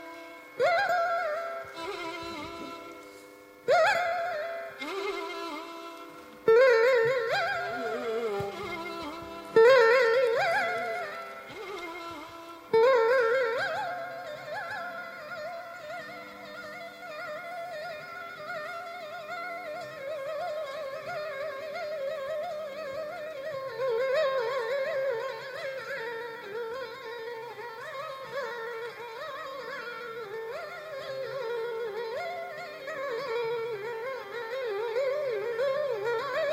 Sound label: Climax with applause; Climax of Kambhōji ālāpana (Srinivas 1995, 11:16–11:58); Karnatak ālāpana